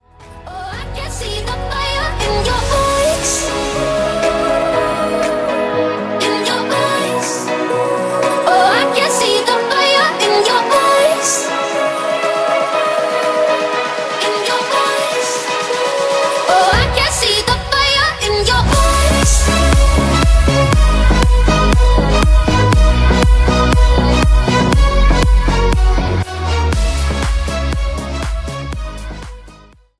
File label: ringtone